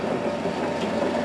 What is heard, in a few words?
slot machine running; Max Payne